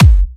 Bassdrum

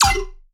Riddim Zap

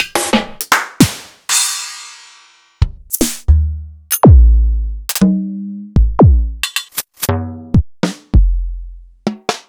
EMU Orbit

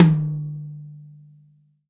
TOM, Real Drum Kit, FUNK KIT

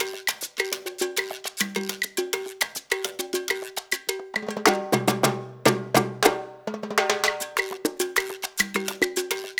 LOOP, Percussion

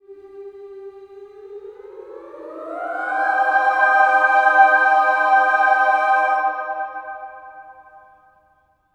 SLIDE CHD, Symphony of Voices; GLIDE CHD

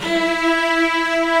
STR SEC; AKAI S-Series CD-ROM Sound Library VOL-2; ST.STR

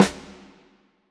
Snare, Orchestral Percussion One Shots; Sonic Foundry (Sony Creative Software); Orchestral VOL-2

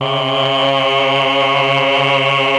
CHOR; ILIO; Propeller Island Legendary M400

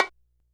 Yamaha RX-120 Conga High Mute

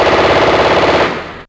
M Gun, Fairlight CMI IIe Machines

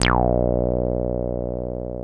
303 A2, TB 303

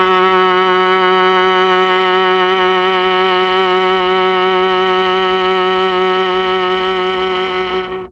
F#2 VIOLA, Mellotron; Mike Pinder - Mellotron Archive CD

